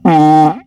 funny, farts

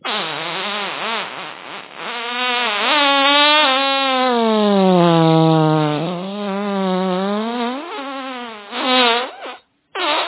farts

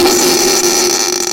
eigen fart

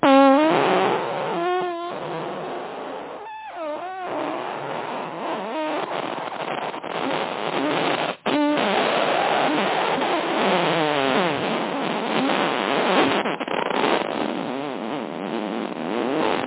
second fart

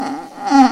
eigen fart

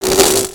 fart hello

